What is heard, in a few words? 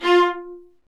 Vas1 Marcato